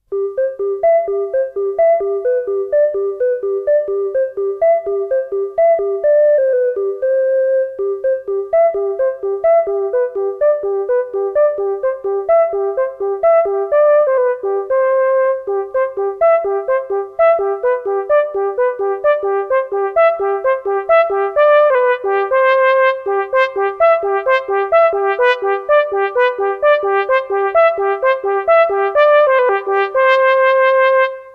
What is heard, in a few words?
Trumpet Audio